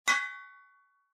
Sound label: forge strike; The Witcher Hunt